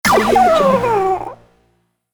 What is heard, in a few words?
gremlin death